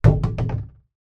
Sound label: industrial container large plastic empty knock over; zapsplat